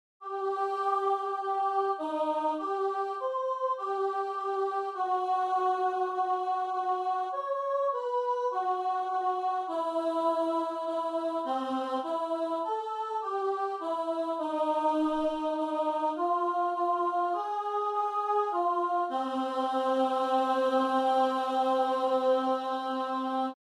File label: breathe a song 4th verse harmony only